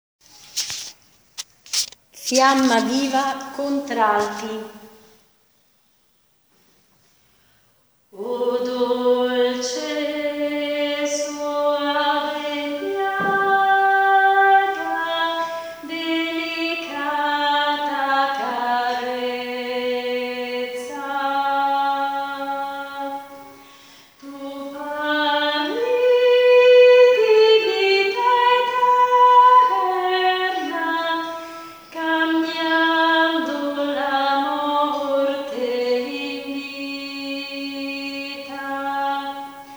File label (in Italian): contralti